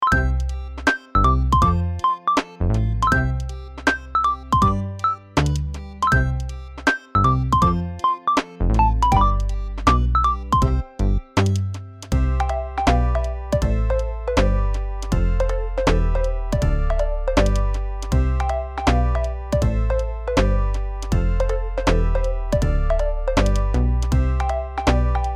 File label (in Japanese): おやつの後は眠くなるLoop～まったり配信向け 作業用 かわいい 午後の休憩に～; にゃるぱかBGM工房; nostalgic; relax; sad; guitar; synthesizer; ポップ